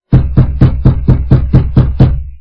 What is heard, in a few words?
knack door